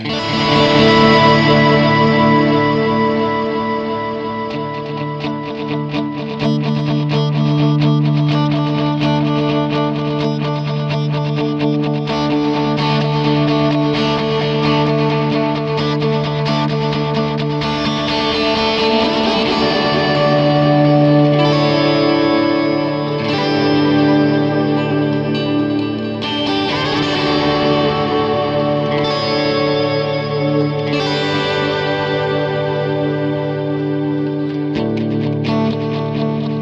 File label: Ambient Echo; MXR Joshua